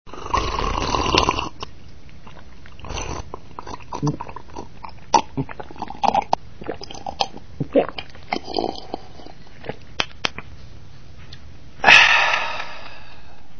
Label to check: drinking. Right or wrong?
Right